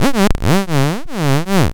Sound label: LFO, Noise